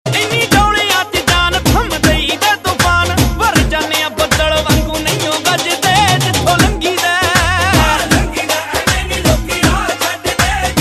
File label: Punjabi